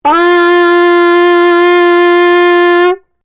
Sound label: HORN TIBETAN LONG, Ornamental brass horn; Horns; Musical